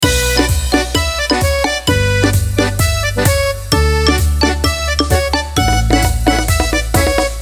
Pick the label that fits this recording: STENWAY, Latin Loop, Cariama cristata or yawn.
Latin Loop